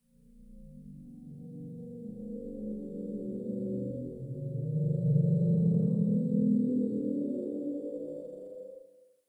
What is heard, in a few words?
electric moan